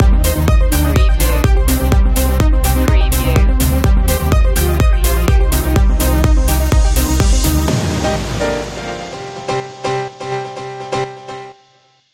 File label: swedish trance stinger